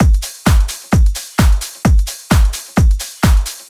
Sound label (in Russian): сэмпл ударных евродэнс